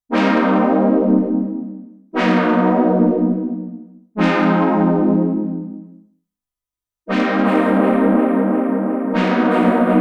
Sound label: KORG Poly Synth; KORG Integer